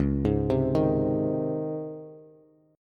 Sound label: Db Major 7th Suspended 2nd, Bass; 9,11,10,8; arpeggiated; chord shape